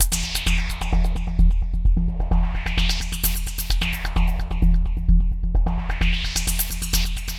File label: SWEEPSTEPZ; Distored Reality